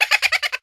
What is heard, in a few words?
LAUGH; SSBM; GREATBAY